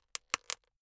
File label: plastic slowly highly pressured